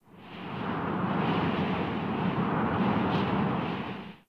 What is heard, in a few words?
Wind Heavy Fast Gust; Ambience; Dungeons; Under City